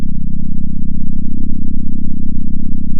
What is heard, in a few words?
center, sound of a small motorcycle